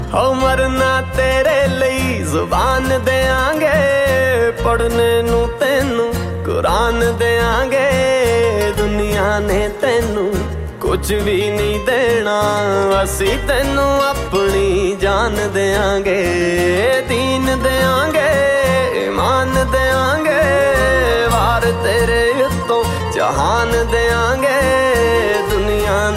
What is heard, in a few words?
Punjabi Ringtone